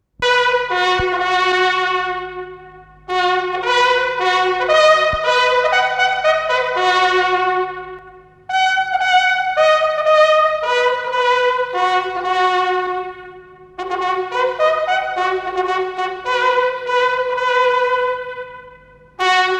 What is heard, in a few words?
revellie, bugle